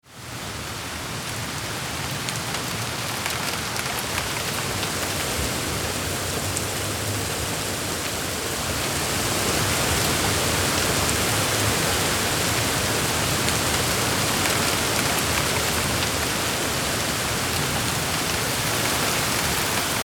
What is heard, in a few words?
Crackling leaves